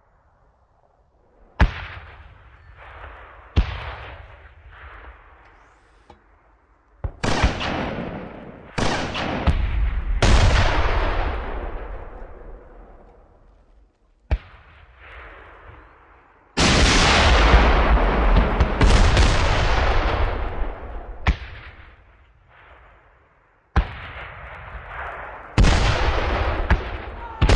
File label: sounds of war